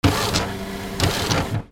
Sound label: OFFICE